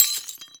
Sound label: physics glass shards default